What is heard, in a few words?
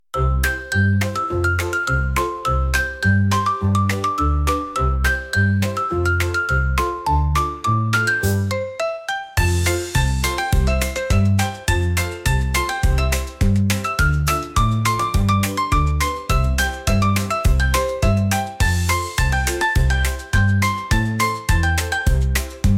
magical expedition, detskaya muzyika